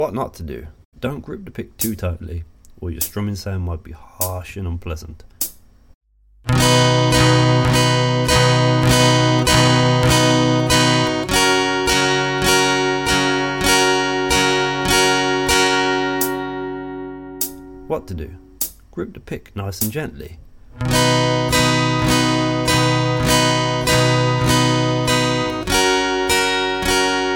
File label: Tight Grip Vs. Soft Grip, capo 4; Ultimate guide to strumming book